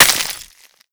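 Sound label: destroy icicle break small; Explosions; Call of Duty Modern Warfare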